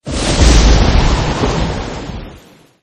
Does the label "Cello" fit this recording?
No